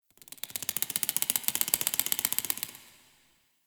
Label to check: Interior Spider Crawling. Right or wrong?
Right